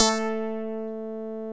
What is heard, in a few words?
FM BASS; AKAI S-Series CD-ROM Sound Library VOL-7